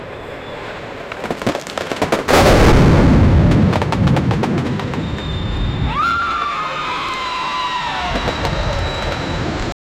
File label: a wide angle aerial view